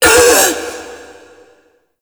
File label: Minigame Ring Fail; Birth Minigame; Warframe; Jade Shadows